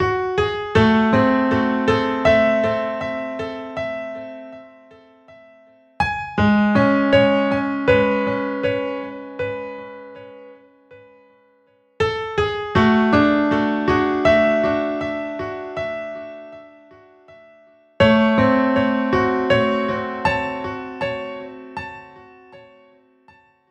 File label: piano